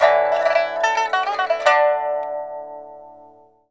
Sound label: PIPA PHRS, CHINESE SET; Spectrasonics; Heart of Asia